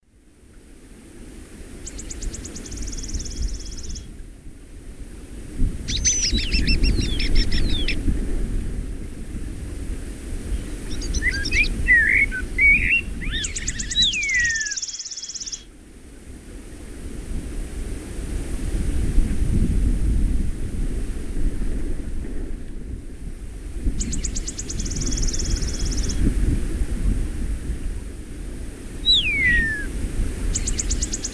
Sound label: vent wind